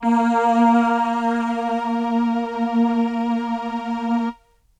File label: VP330 Combo Vinyl Synths A#2; vinyl keys